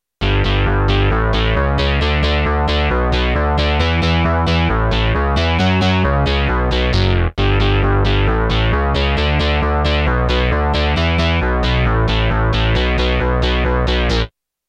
Bass

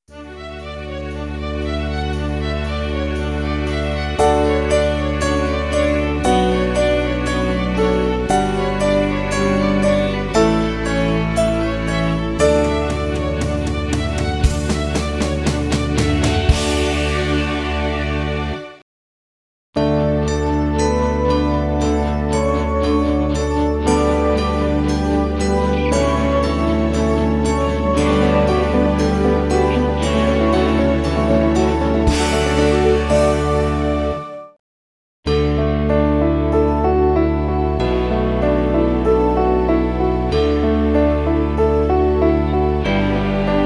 Cchime A

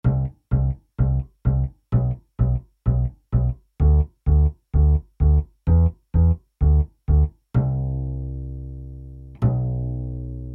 Bass

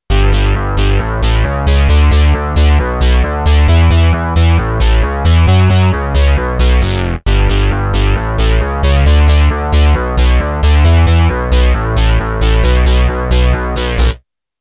Bass Mix

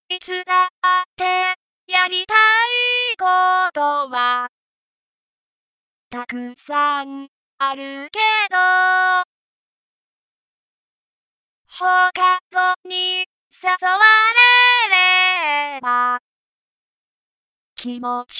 Vocaloid; PreMix

